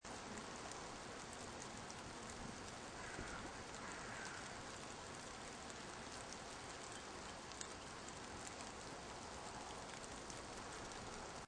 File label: Light Rain With Crow Loop, Light rain with occasional distant crow krah, seamlessly looping sound; Lynne Music